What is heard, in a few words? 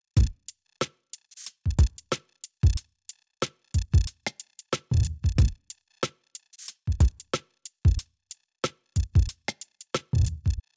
sidechain gate sample avec